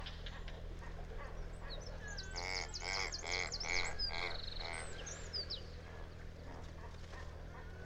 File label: Razorbill